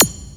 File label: KLINK, INDUSTRIAL